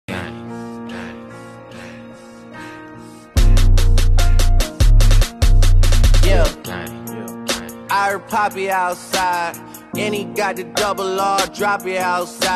english ringtone

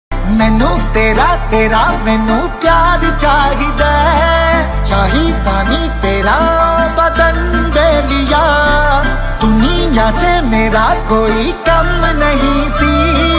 punjabi ringtone

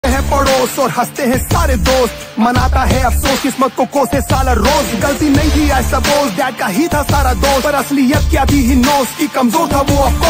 hindi ringtone

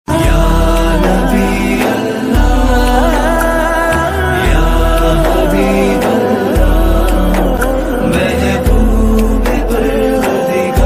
islamic ringtone

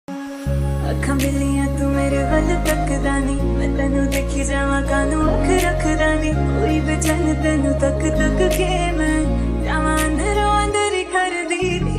hindi ringtone

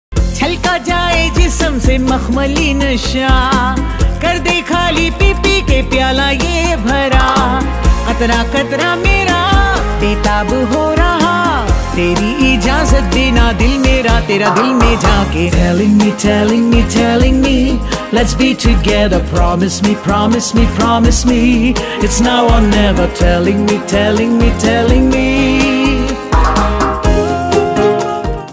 remix hindi ringtone

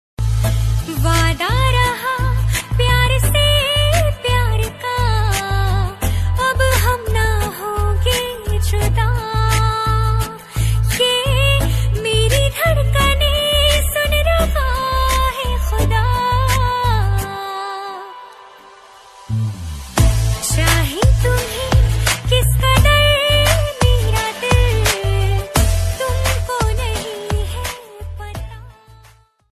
female hindi ringtone

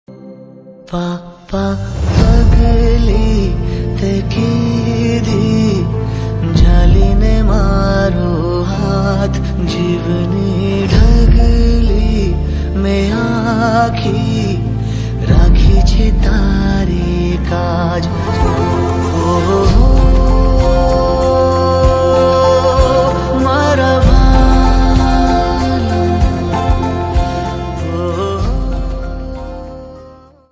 ringtone

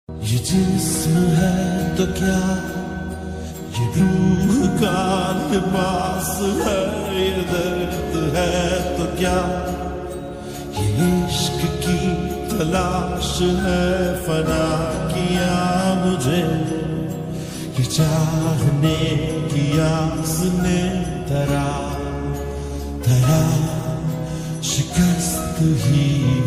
lofi hindi ringtone

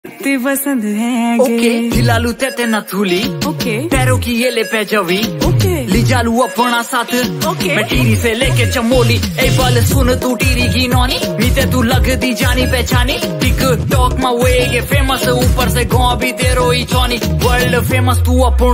uttrakhandi ringtone

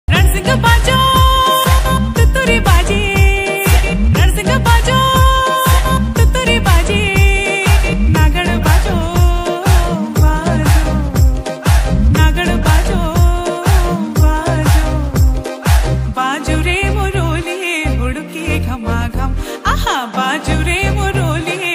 uttrakhandi ringtone

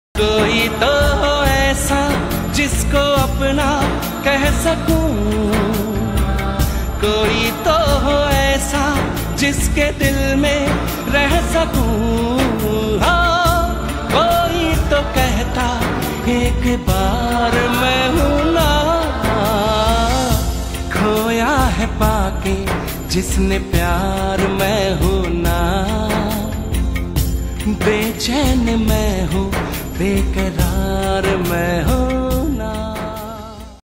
Sad hindi ringtone

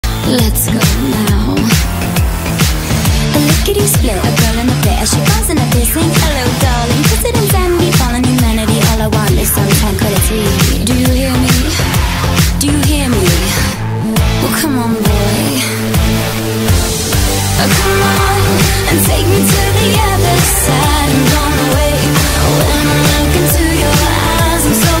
english ringtone

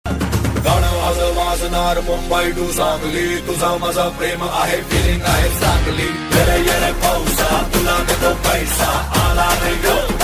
marathi ringtone

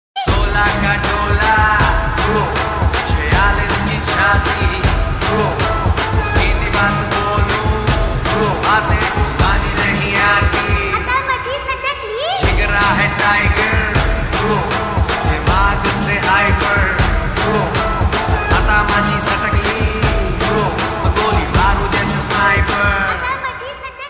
lofi remix ringtone

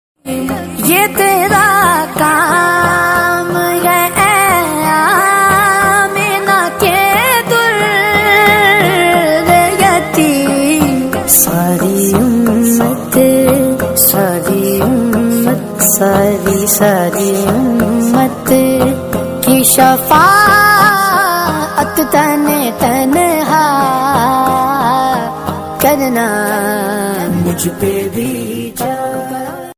Islamic ringtone

